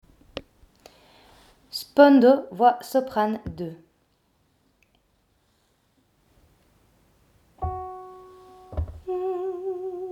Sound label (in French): voix soprane; Spondo Soprane2